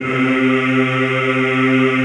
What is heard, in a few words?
C2, Classical Choir; Best Service; Extended Classical Choir